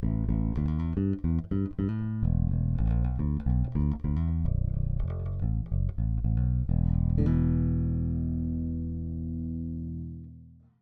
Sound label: Fralin Big Single Active Middle, guitar